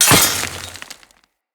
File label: Dagger Stone Impact; Weapons; Dual Daggers; Hit Effects